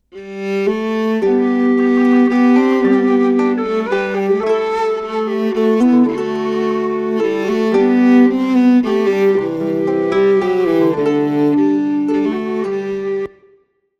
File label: irish lamentation